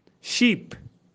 sheep

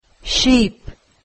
sheep; english; describing animals

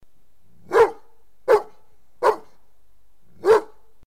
dog; grade1; sound sci

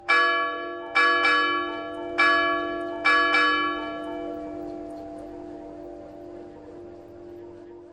bell; grade5; communication new

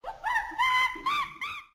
monkey; simple messages

